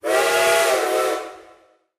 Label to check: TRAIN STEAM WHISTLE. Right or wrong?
Right